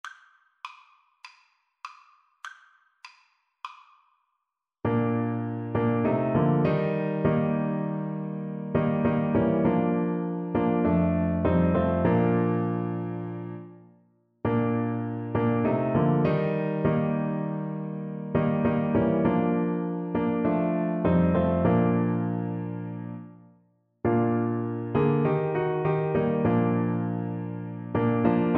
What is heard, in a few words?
how great thou art, Tuba